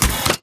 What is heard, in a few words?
Mvmt Servo Head Extend; AI Stalker; Titanfall 2